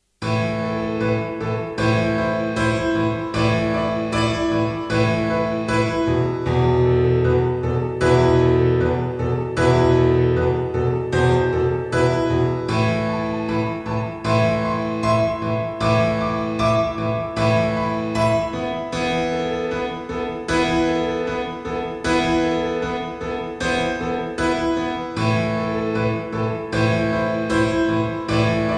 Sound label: piano pop